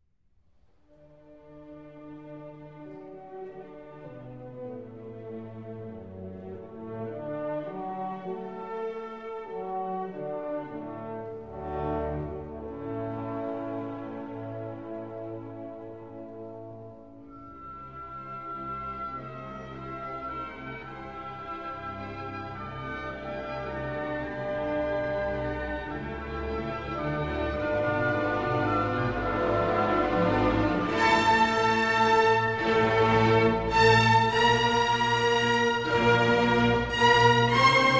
Violin Concerto in D Major; Op. 77; Brahms

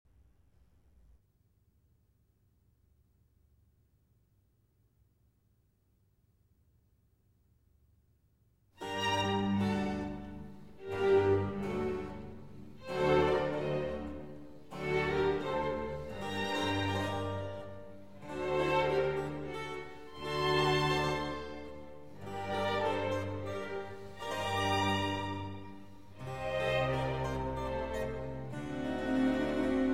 Concerto For Violin And Strings In G Minor

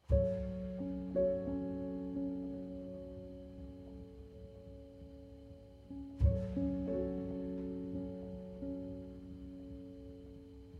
Solo Piano

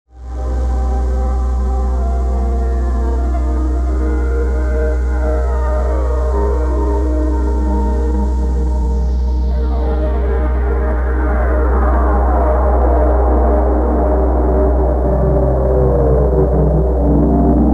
Shenzhen Rain Showers

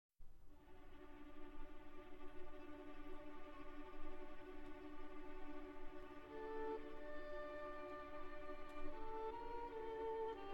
Violin Concerto No. 1 in D Major, Andantino; Prokofiev; Op. 19

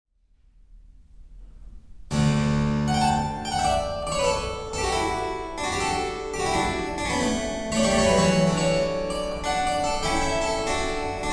Keyboard Sonata in E Major, Andante

